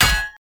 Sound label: Hammer Impact Shield; Weapon Impacts; Player; Combat